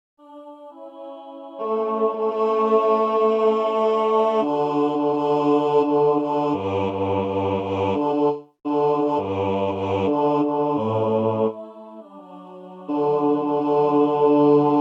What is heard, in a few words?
Bass